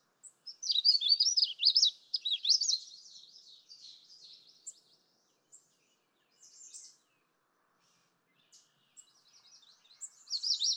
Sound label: Magnolia Warbler